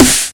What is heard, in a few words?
original acoustic snare e key